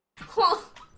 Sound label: Child Huh